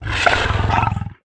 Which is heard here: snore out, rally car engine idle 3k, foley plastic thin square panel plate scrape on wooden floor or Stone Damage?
snore out